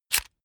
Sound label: electric drill template slide out fast; maschinen; bohrer; soundpool